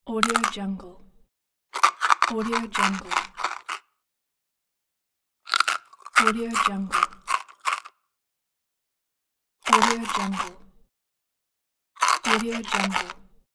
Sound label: chewing crisps